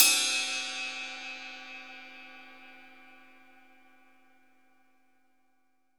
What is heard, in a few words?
RIDE, GM drum set; AKAI S-Series CD-ROM Sound Library VOL-8